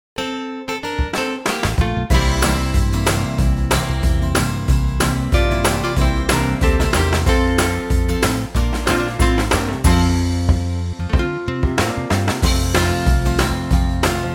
F country

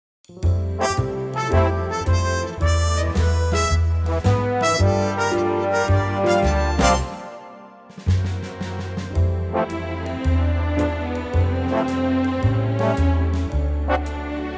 F orchestral

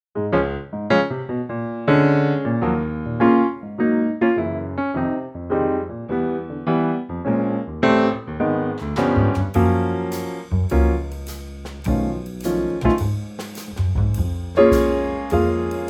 Db Trio; key - Db - vocal range - G to Ab; lightly swinging in the classic Trio format

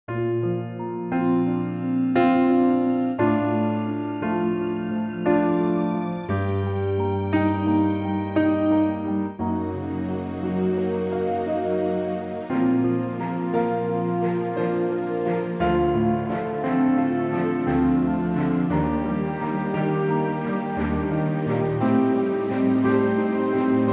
Bb orchestral; 9/8; key - Bb - vocal range - D to Eb (optional F top note); Gorgeous orchestral arrangement of the wonderful big song from "Man From La Mancha"

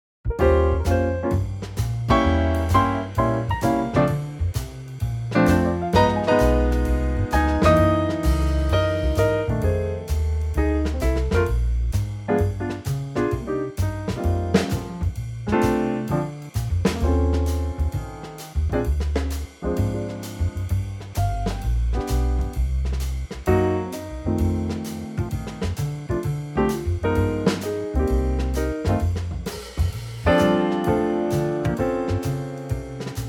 Bb trio

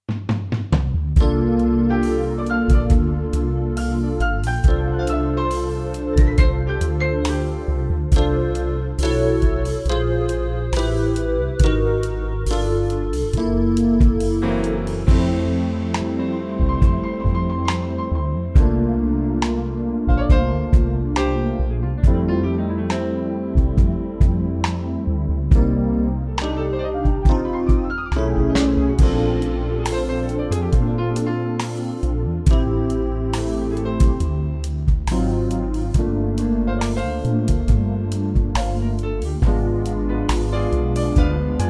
smooth F

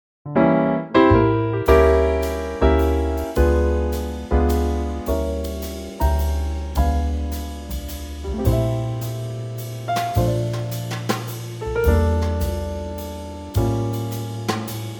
3/4 Trio Dm; key - Dm - vocal range - D to D

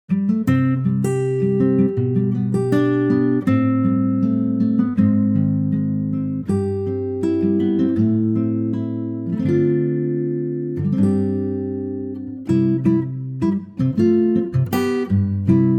C acoustic guitar; key - C - vocal range - G to A; Stays in tempo all the way

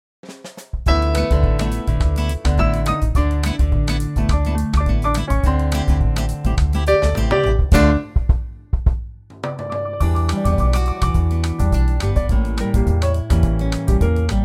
F Latin

